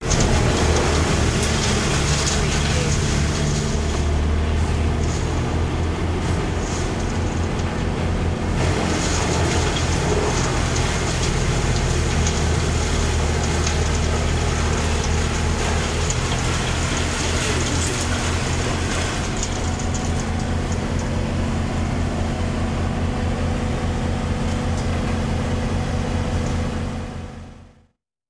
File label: Wood Chipper